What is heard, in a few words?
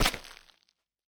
Footstep Sand; Opsive; UltimateCharacterController